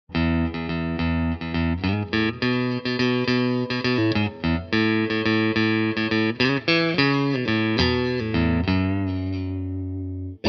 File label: Middle Clean